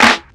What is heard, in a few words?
snare organic